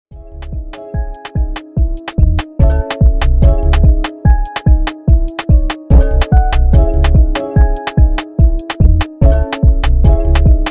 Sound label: PELELE Instrumental